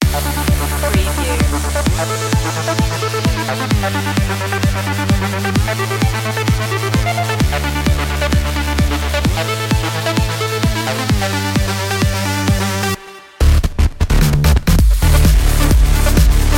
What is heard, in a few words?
acid funk